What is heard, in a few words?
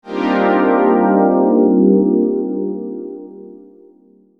Digi Pads; Jungle Frenzy 1